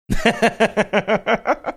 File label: laughing